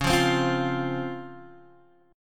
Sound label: Db Major 7th, Guitar, Open G